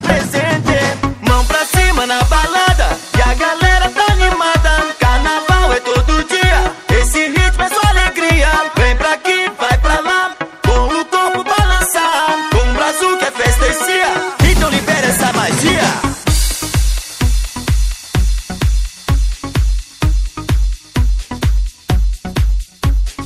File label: brasil ringtone